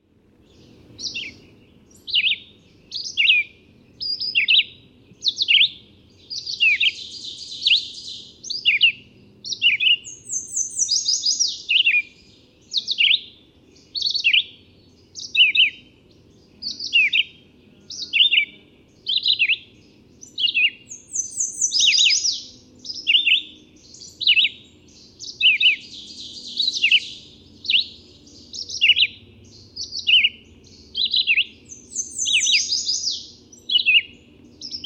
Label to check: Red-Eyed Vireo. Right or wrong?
Right